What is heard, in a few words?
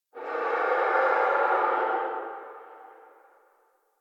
Tree Distant Critters Angry, Ambience